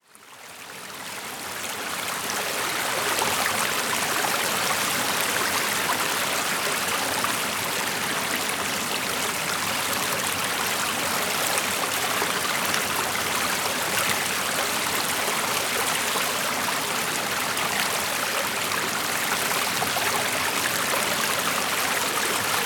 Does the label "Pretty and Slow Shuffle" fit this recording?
No